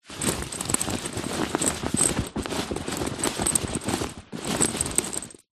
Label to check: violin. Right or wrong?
Wrong